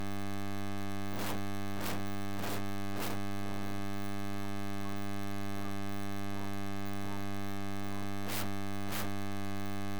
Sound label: less noisy noise